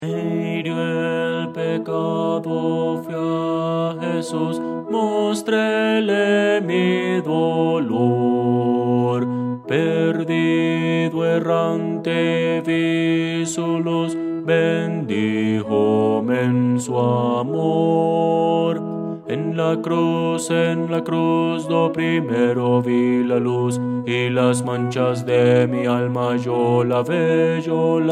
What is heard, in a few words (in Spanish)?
bajo